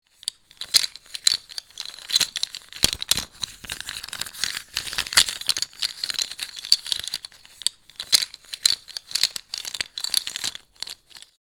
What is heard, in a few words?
Plastic toy handling objects movements; gfxsounds